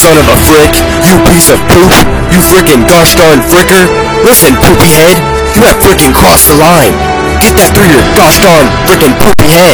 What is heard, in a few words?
raging kid